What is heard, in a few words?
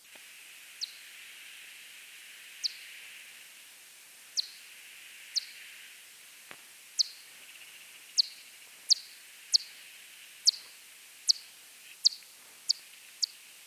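Myotis mystacinus, bat; Boehm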